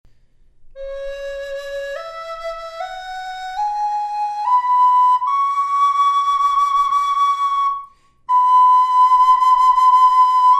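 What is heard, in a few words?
reverb, flute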